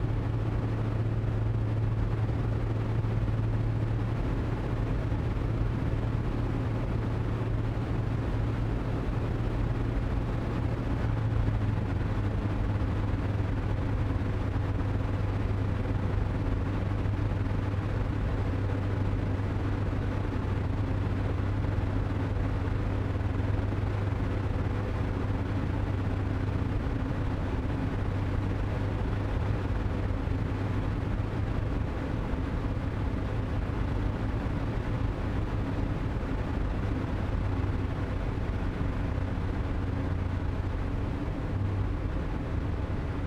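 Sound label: Zivko, Edge, 540, Onboard, Descent to Land, Left; MKH8020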